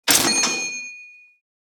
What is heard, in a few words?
Cash register bell cha ching purchase